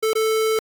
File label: pipii